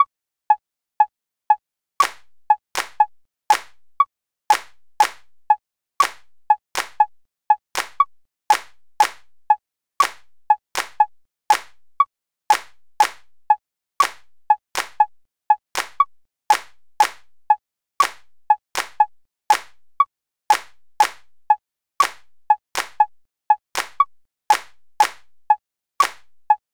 haltebogen 120, rhythmik

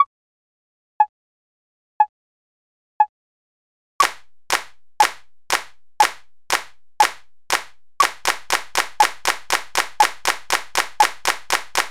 sechzehntel 60, rhythmik

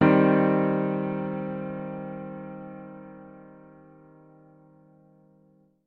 moll akk, gehoerbildung

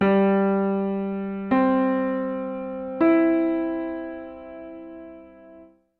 dur arp

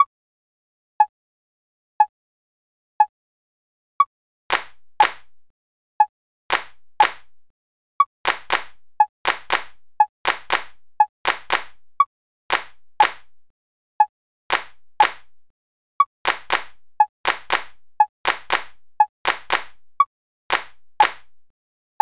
sechzehntel 60, rhythmik

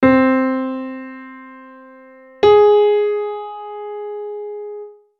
kleine sexte, gehoerbildung

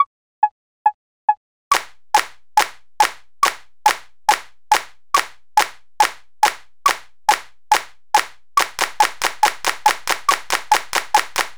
notenwerte 140, rhythmik

